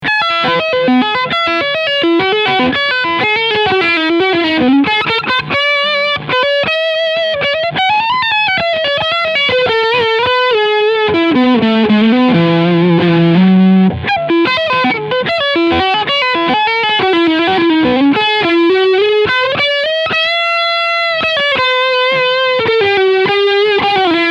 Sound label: High Gain Steg PU